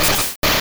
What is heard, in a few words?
Vine Whip; sfx; attack moves; Pokemon; gen 1; rby